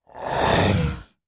Monster Zombie Growl

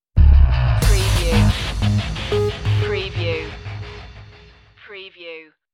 agony stinger

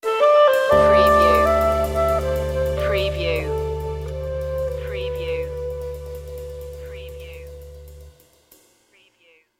sting

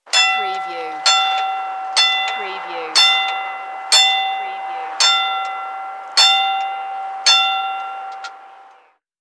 Street Car Bell

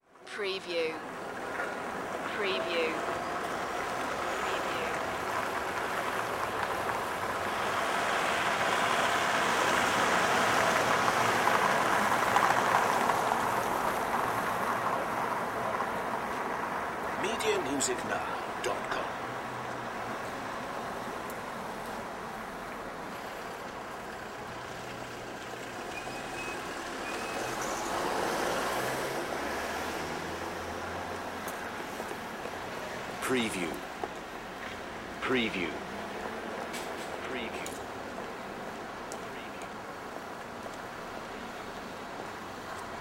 City Ambience - Street corner occasional tram

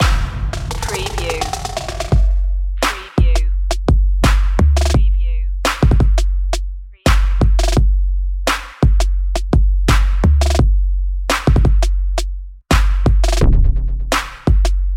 hip trap underscore

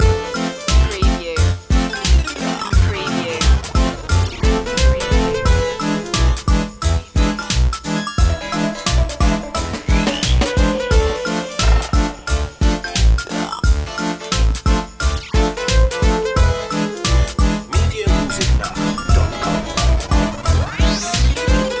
retro video game kazoo world loop

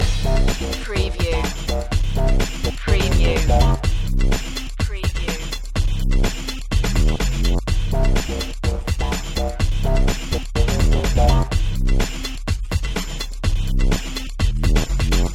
stutter loop

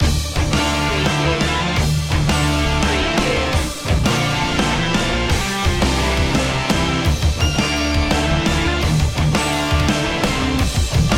8bit rocktronic

